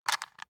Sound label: DICE on Wooden Tray, Grab, Standard, 6 Six Dice; Samples; ArticulatedSounds; DICE Sfx Party (Extended)